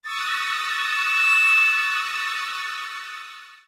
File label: Helicopter FLOURISH; Bolt